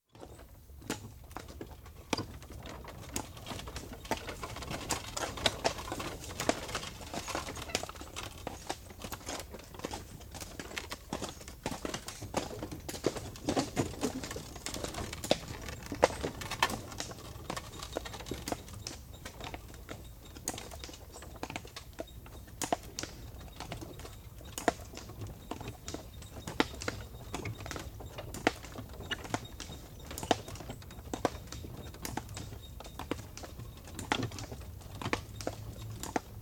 One horse & cart, turned & procedes very slowly down hill, brake taken off, cart stops (Recorded on cart); Horse Sound Effects; BBC